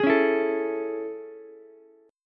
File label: A Major 7th A, Ukulele; AM7 strummed; JGuitar